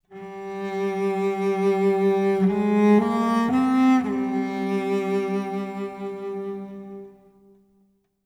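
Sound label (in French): Music Jingle-Triste; Curse of Atlantis: Thorgal's Quest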